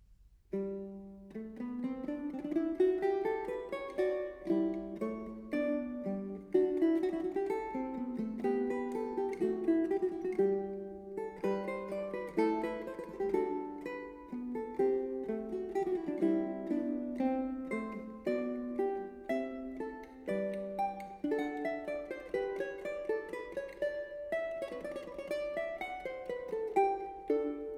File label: Fantasia del quinto tono 4 course guitar; Alonso Mudarra